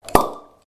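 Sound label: Unknown bottle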